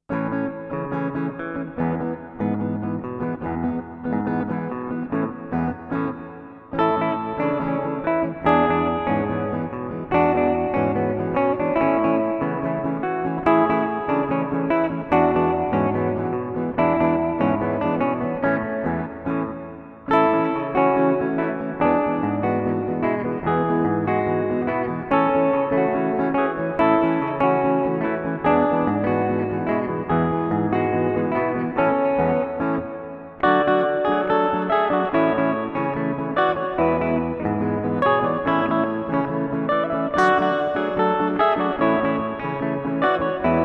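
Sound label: samba brazil guitar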